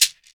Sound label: New Shekere